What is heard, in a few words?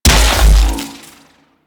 shock rifle shot; Warframe; Weapons; Corpus